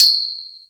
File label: PERC